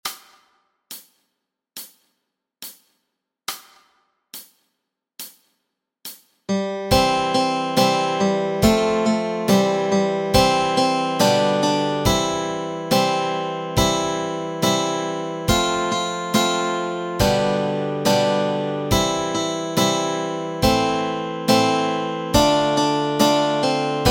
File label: Bingo Melody and Chords Track